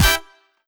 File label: brass hit